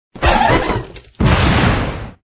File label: pet armadillo